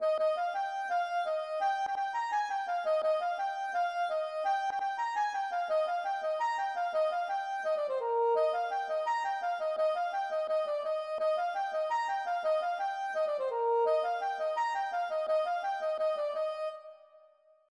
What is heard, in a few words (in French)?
ridee